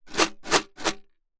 Coins Shake Tin

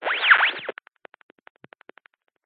cb radio walkie talkie short static burst glitch; technology

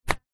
Golf Shot

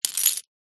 shells small handfull place down; foley

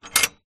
household gate bolt movement

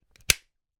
toy eyes clip in; mr potato head

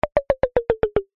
cartoon descend knocks wood delicate tip toes

